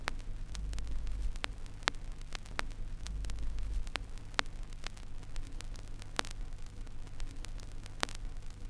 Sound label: Crackle, Vinyl Noise